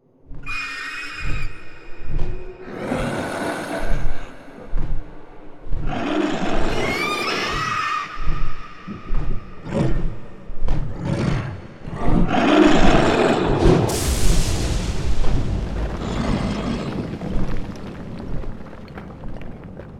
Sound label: Fantasy Monsters Dragon Air Attack